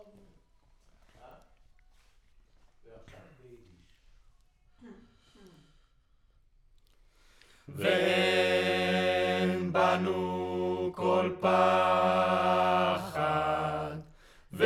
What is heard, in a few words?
Choir